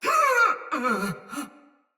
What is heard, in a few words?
Death Soldier Mace Agent Female